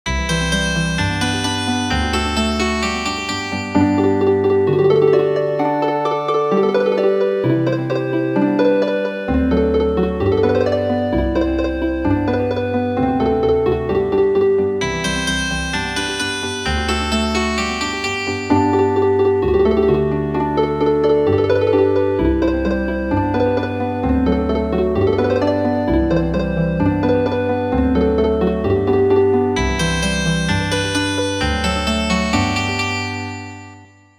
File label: Healing Harp, midi traditional